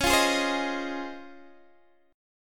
Db Major 9th, Guitar, DADGAD

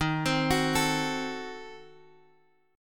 D sharp Diminished 7th D sharp, Guitar, DADGAD; 13,15,16,14,x,x; chordshape

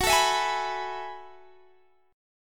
F sharp Minor 11th, Guitar, Standard; strummed; capo 9

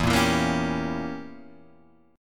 E Diminished 7th E

